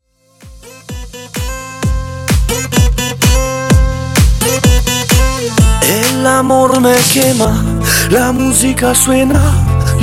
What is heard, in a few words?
Dance ReDrum, 128 Bpm